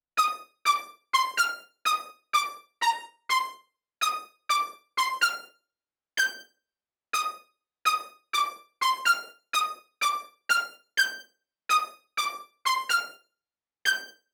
Melody C 125 Dry

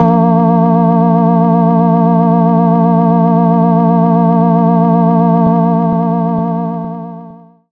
Moogy Bass

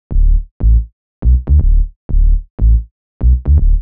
Bass 121bpm Dm Synth Sub

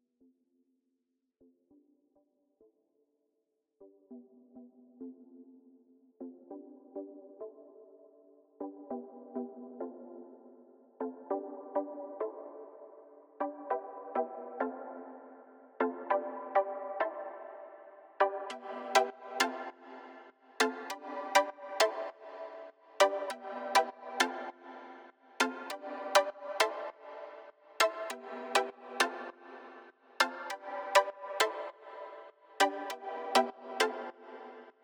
Pluck

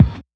kick; executive

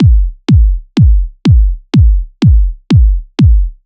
deep kick 124bpm

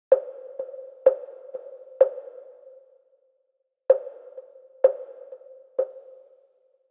SYNTH

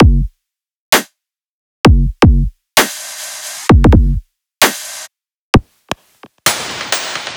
130 DRUMLOOP

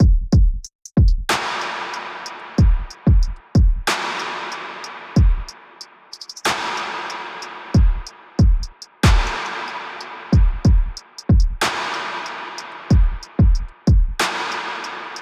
Drum loop 93

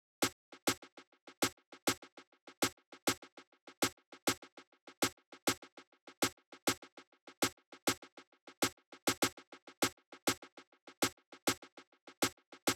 Snappy snare

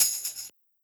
Tambourine

